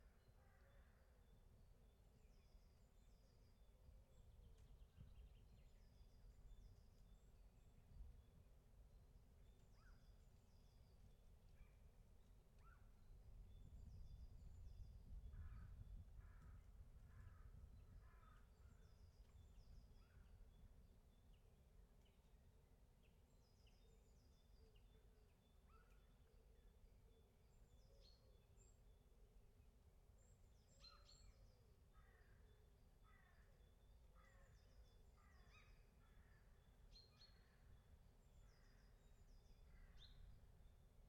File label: Suburb Outskirts - Forest Edge - Outside Summerhouse - Many Birds - Wind; 7.1.4; Atmos; Pole Position; Ambiences for Atmos Vol 2